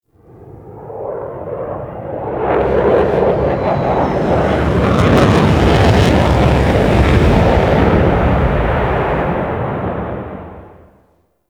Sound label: Gas City Space Fighter Approach; Warframe; Corpus